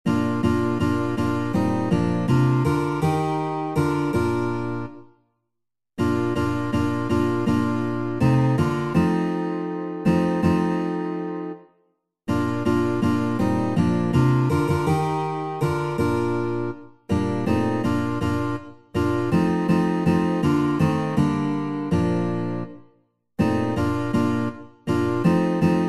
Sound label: satb, midi